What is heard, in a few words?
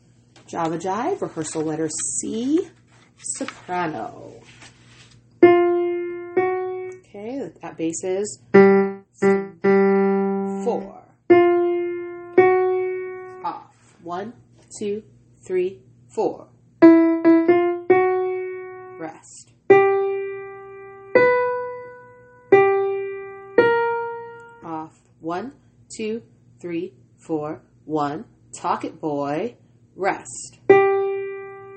soprano